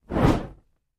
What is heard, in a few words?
Torch Movement